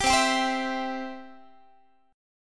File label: C Major, Guitar; strummed